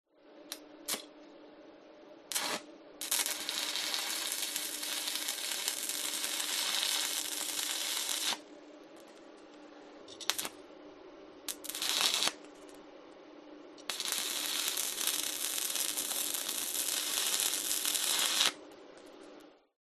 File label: industrial stick welder welding sparks